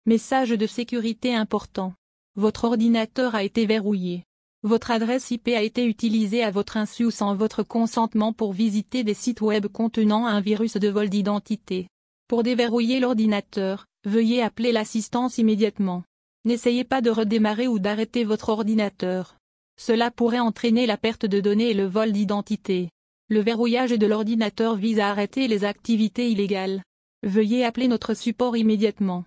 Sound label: Fm7 alert